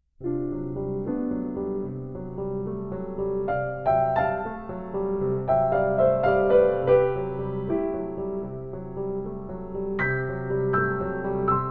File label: A Minor Third Down